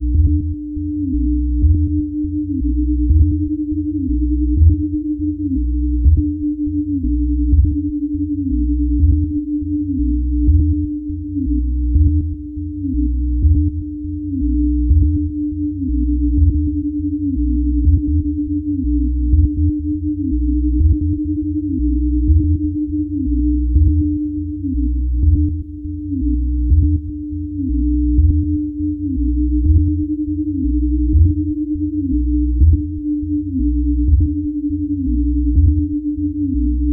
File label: Musical Hum, Sci-Fi Interface; Construction Kit Vol. II; Sonic Bat